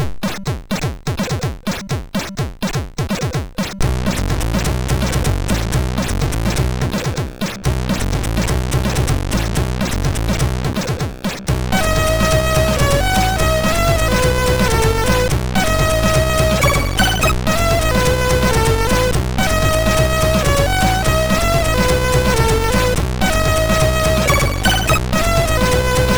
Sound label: tune, MOS6581R3; Party Demo 2 tune 2